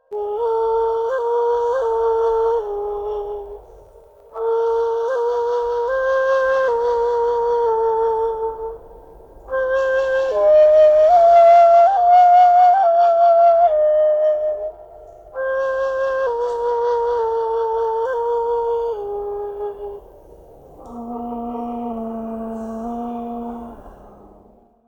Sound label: Flute